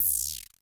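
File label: Klone Dual Percussion Synthesiser noise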